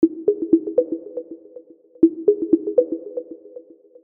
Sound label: Call